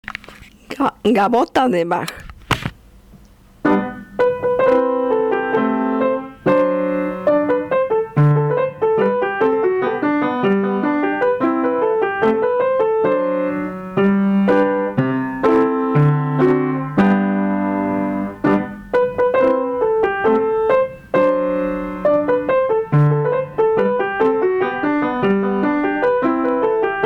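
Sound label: Gavotte, pianomusic; Bach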